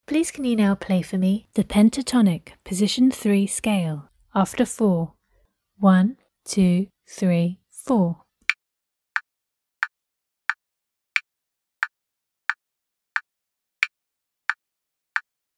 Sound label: Pentatonic position 2